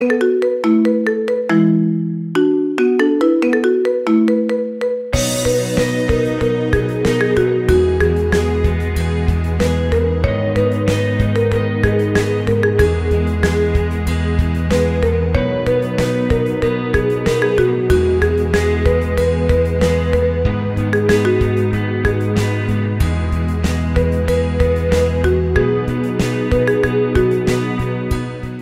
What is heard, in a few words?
Marimba Remix